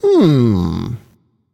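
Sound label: thinking, nonverbal; smite; voicepack; khepri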